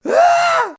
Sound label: Strange Male Scream